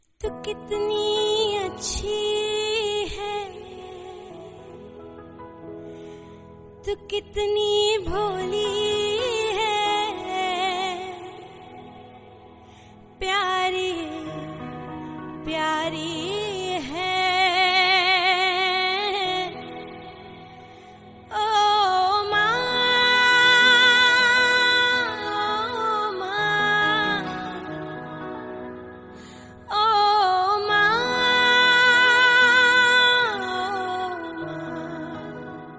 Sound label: Hindi Ringtone; Mothers Day